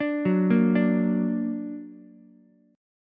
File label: D Augmented, D, down, Ukulele; D+ arpeggiated; JGuitar